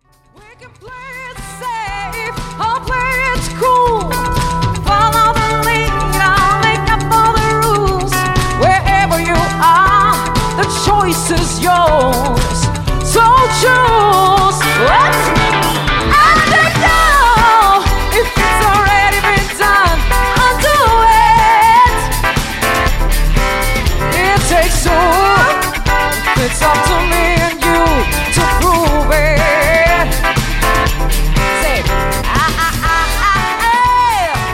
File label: sax vibes Partymusik